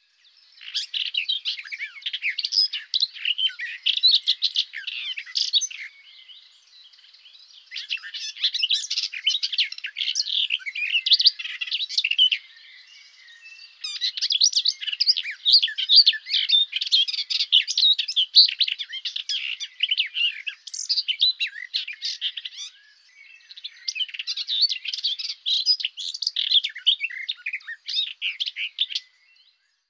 Fauvette des jardins

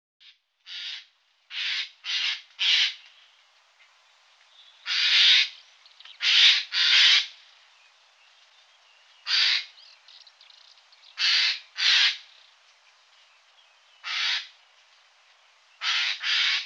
Geai des chênes

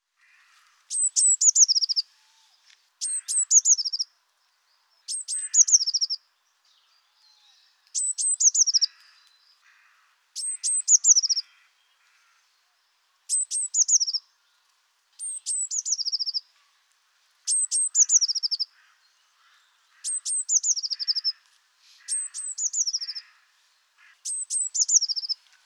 Mesange bleue

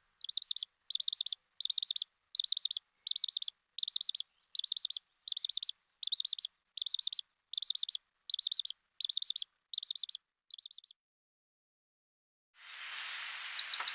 Scolopacidés Actitis hypoleucos Chevalier guignette

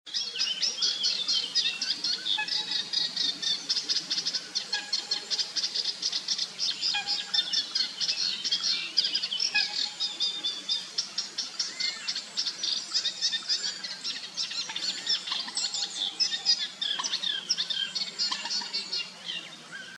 Rousserolle

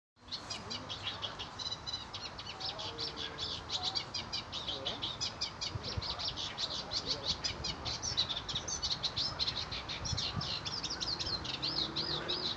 Rousserolle effarvatte

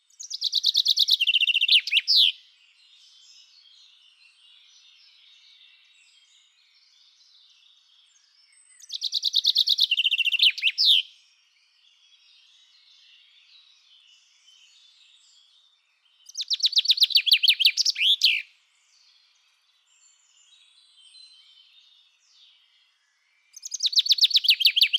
Pinson des arbres Chant

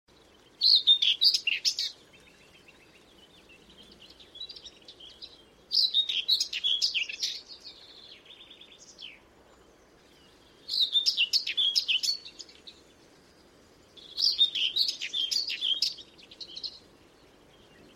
Fauvette grisette

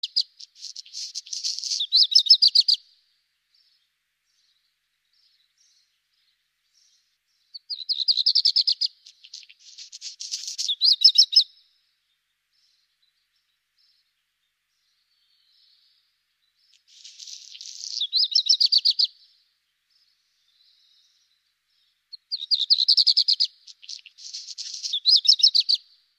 rougequeue noir

